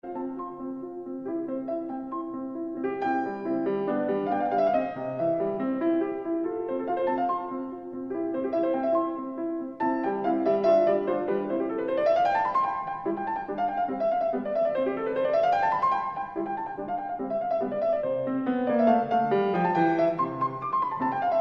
Piano Sonata No. 10 in C Major I. Allegro Moderato